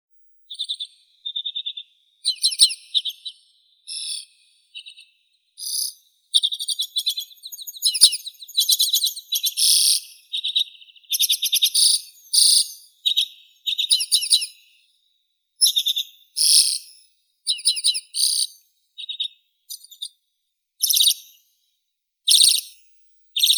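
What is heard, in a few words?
Arctic redpoll, Acanthis hornemanni; chechetka pepelnaya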